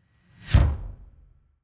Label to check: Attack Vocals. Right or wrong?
Wrong